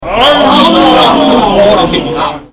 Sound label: omnomnom